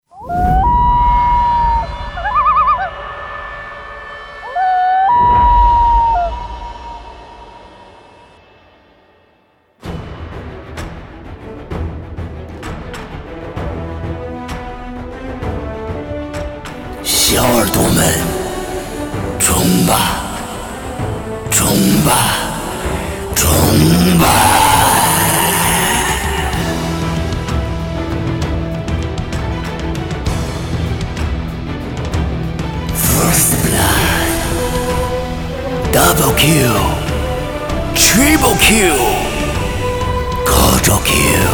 男 音乐; 王者荣耀片花

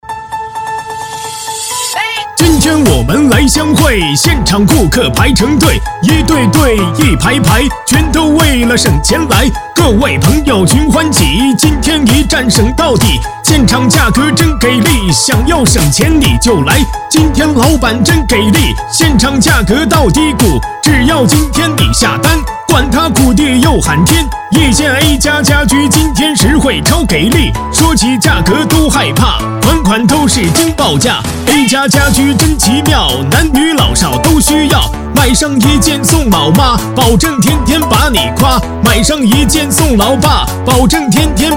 男 戏曲 喊麦; 今天我们来相会

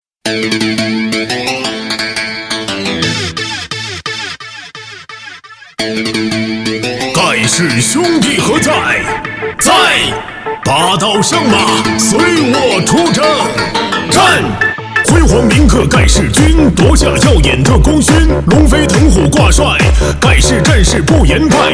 男 模仿 喊麦的; 战马

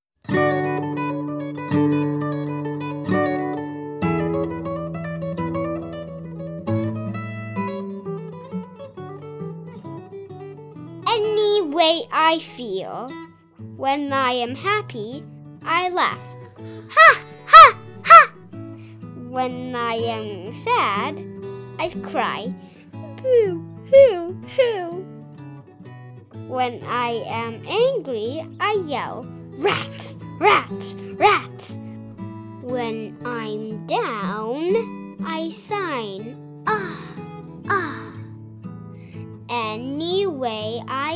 外籍童声男 唱歌

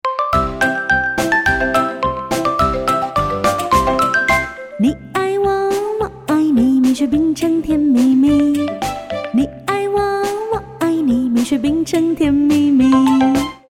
女,中文歌曲翻唱,成人女声

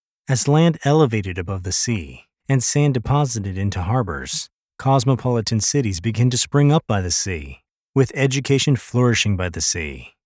笑笑

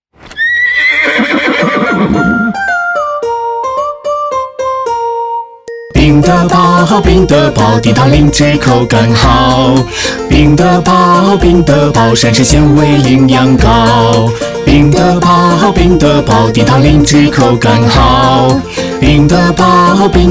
男 戏曲 唱歌 男女合音; 冰得宝

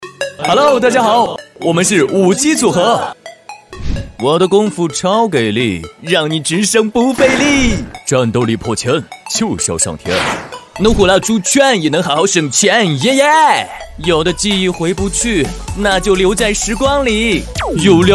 男 rap; 移动5G

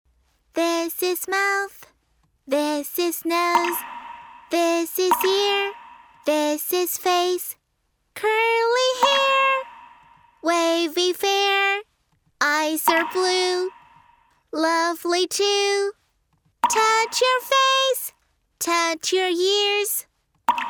女 英文歌曲 英文歌

